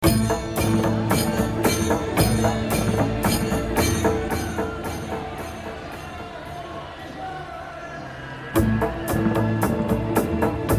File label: India Loop